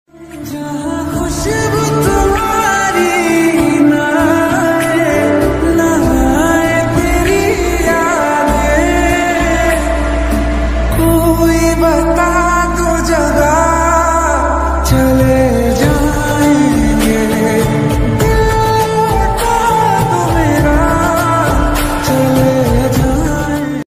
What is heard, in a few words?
romantic ringtone, love ringtone, hindi ringtone